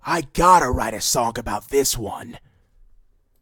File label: game over